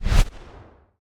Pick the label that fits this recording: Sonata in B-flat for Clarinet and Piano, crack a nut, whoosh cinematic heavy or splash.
whoosh cinematic heavy